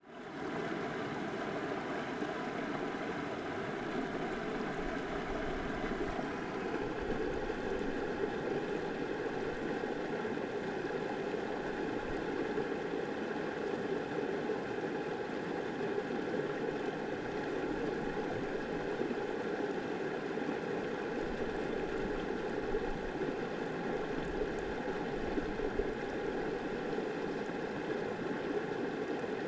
Ambiente de pequena queda de cachoeira dentro da pedra som abafado; agua

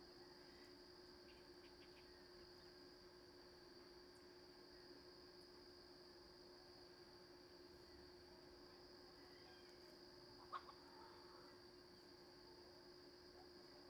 Motor de balsa em fim de tarde com passaros, galinha e galo; maquinas; canais-stereo; colecao sonora do cerrado

